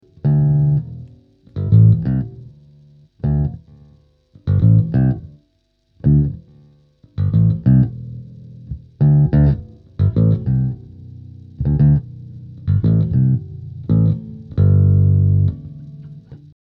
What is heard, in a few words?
elixer bass